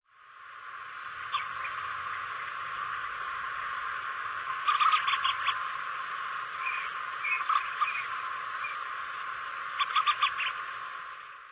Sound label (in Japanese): kinkurohajiro, singing